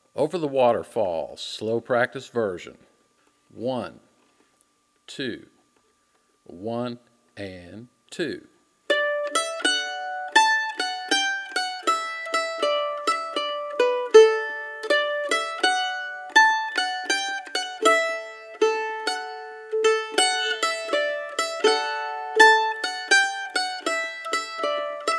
Over The Waterfall Practice Version

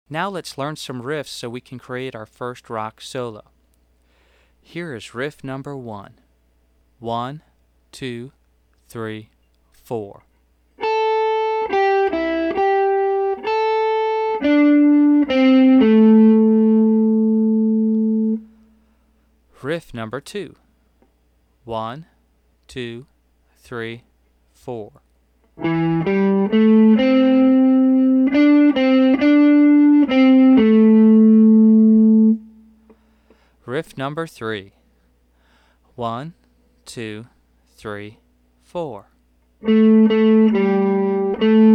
Am Pentatonic Solo

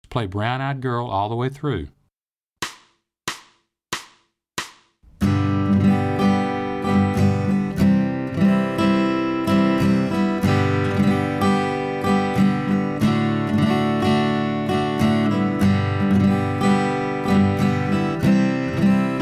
Slow

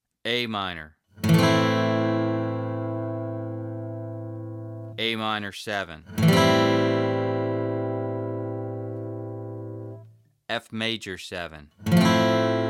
Arpeggios

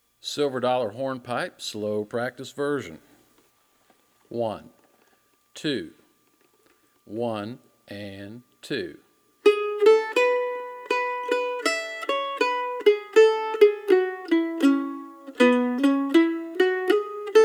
Silver Dollar Hornpipe Practice Version

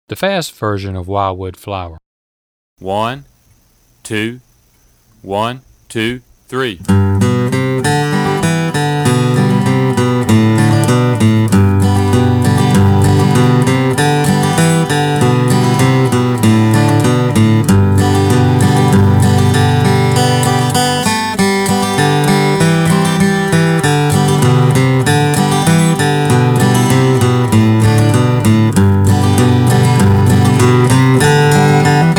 Fast; Wildwood Flower

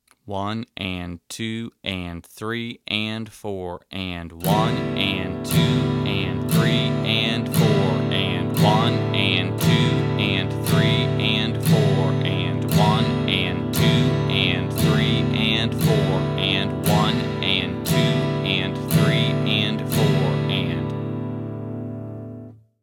Strumming

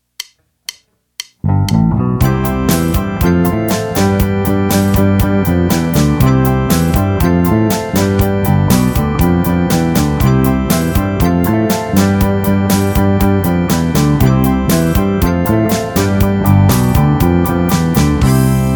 Fast

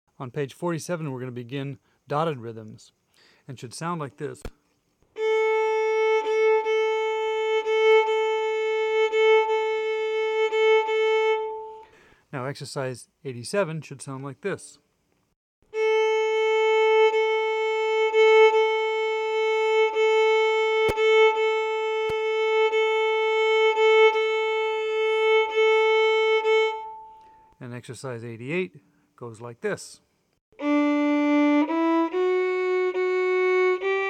Exercise Dotted Rhythms

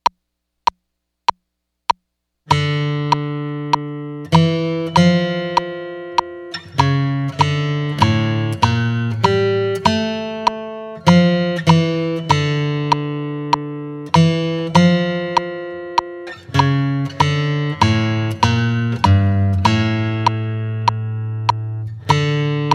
2nd Guitar Metronome; Bouree

